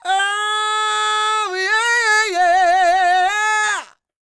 SOUL, Vocal Planet, Blues & Soul; CW SOUL

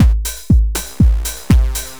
120 C synth, Synth Loops, Trance; Trance Explosion Vol1

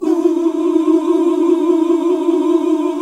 Gosp. Oooh; Sound & Vision - Gigapack II CD 1 (Roland); HAL Gosp. Oooh 2

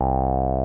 TB303; Zero G; Man Machine

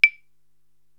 Claves; Korg TR-Rack

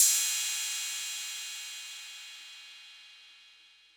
808 Cymbal; Concrete Collage Drum Kit; Concrete-808

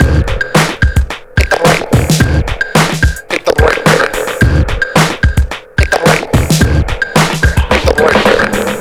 DRM, Breakbeat; HITBREAK 109

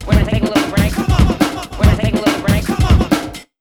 RUN 140, Big Beat Drumloops; AKAI

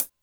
Cym 14' Tite, Drum Machines Alesis D4

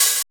DRM OLD, dance synth

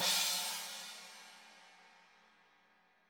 CYM; Bob Clearmountain Drums I

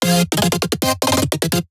Fm chord fill

